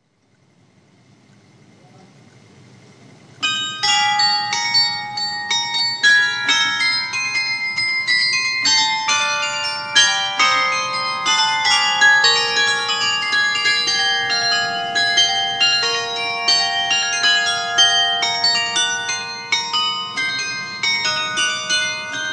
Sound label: carillon; Allerlei 2004-2005